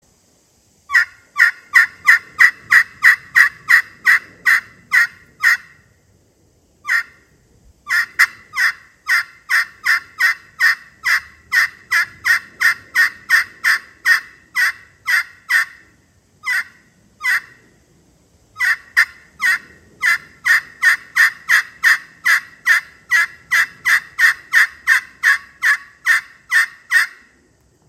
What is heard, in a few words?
Wenge and Canarywood Crystal, turkey calls